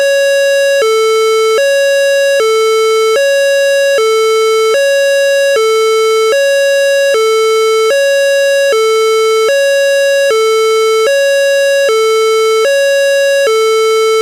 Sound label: euro police 3 slow alternating high low; DP3000